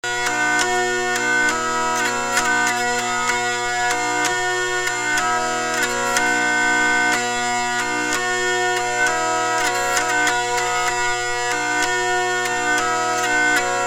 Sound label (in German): oktav ohne, vielle; oldstyle